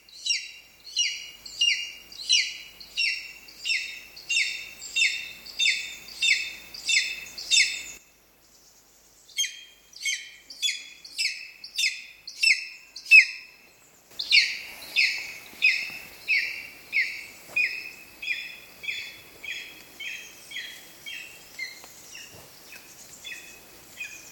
bekaszo sas hangja

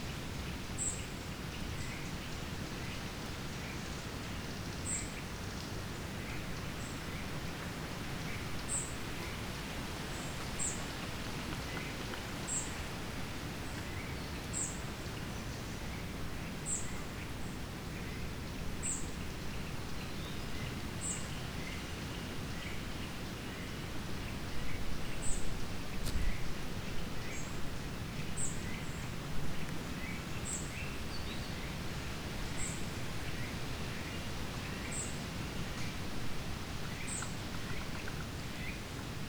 szurke legykapo Muscicapa striata

